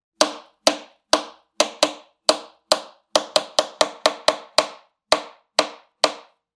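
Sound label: plastic sound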